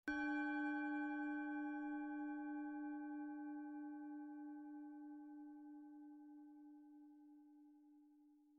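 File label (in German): Planeten Klangschale Saturn, mit Klöppel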